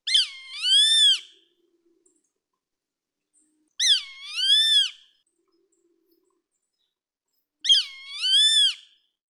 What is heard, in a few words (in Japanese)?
sashiba, birds